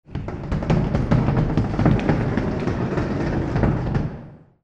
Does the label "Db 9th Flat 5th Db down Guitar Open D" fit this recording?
No